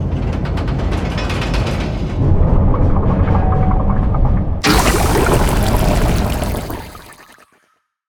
slime tank end pour; naxx

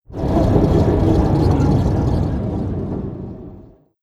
slime tank flow closed; naxx

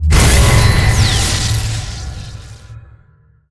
crystal charge end; naxx

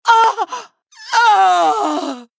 female troll death

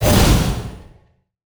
fireblast cast sound; medivh

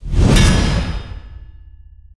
hammer impact; magni heropower

